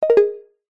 multimedia notification ui simple end call stop tone